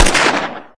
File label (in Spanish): pistola; Pokemon Essentials; Pokemon Opalo